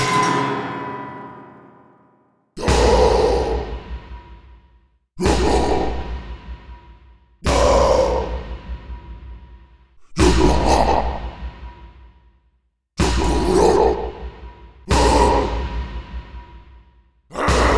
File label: SHATTERING PLANE